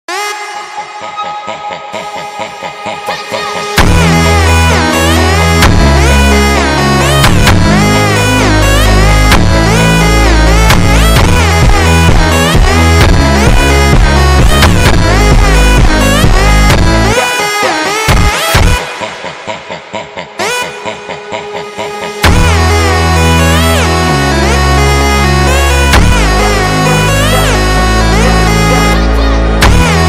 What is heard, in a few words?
funk